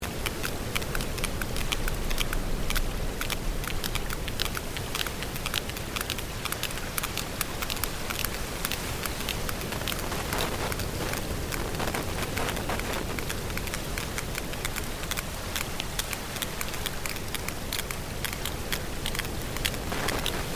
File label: Sperm Whale